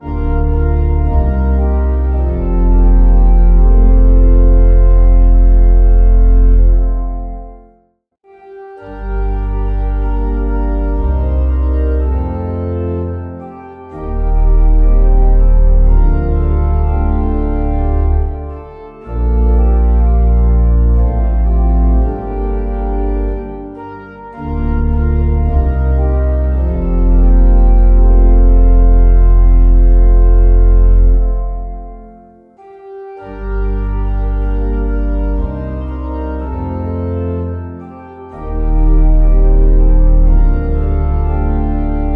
organ, hymn; te lucis ante terminum; Hymnal 1982

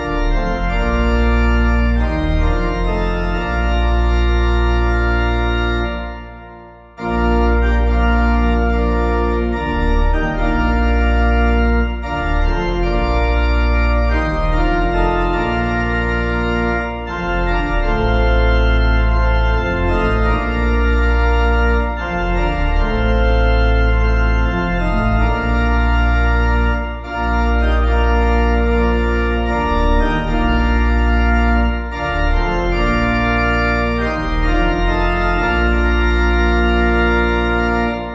organ, Toplady; Rock of Ages

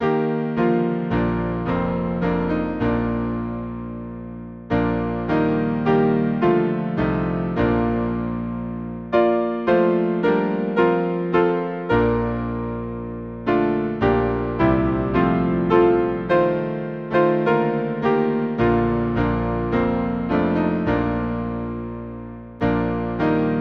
piano, Hymn; Praise we the Lord this day; St George

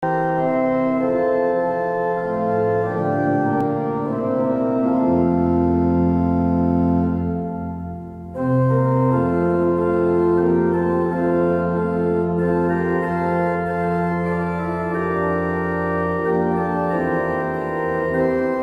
Hymn; Away in a Manger; Kilpatricks Cradle Song; Hymnal 1982

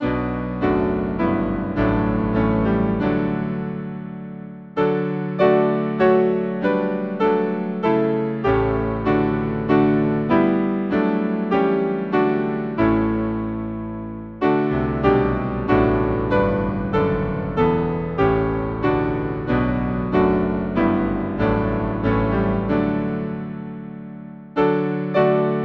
piano, Hymn; How sweet the Name of Jesus sounds; St Peter

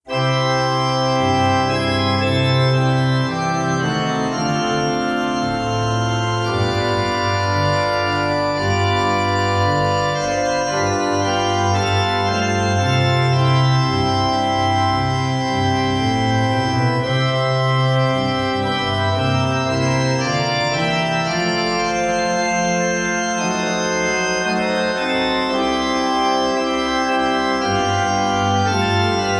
Just A Closer Walk With Thee

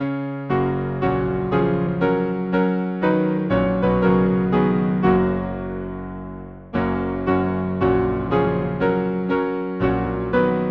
Hymn, piano, Lyons; How wondrous and great thy works

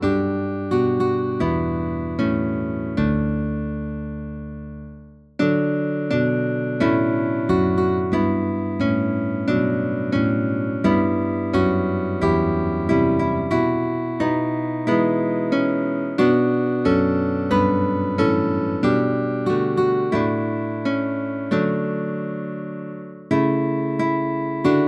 guitar Kyrie; Hymnal 1982

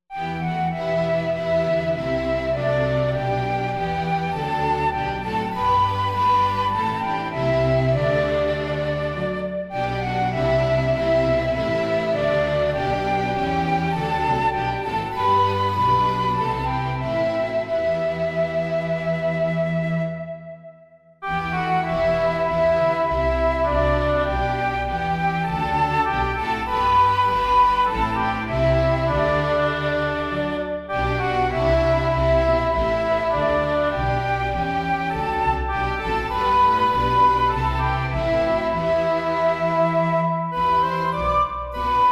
Orchestra; 2 Verses; O Jesus crowned with all renown; Kingsfold; Hymnal 1982

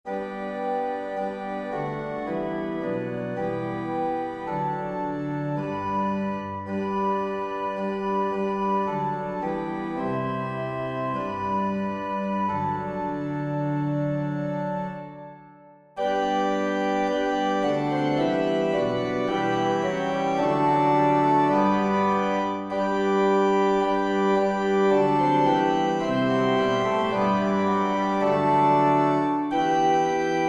Hymn, organ; From all that dwell below the skies; Old 100th

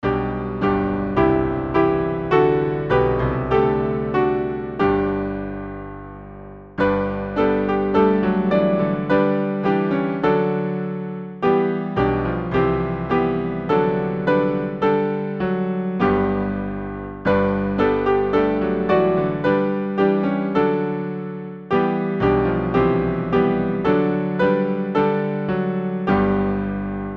piano, Hymn; Book of books our peoples strength; Liebster Jesu

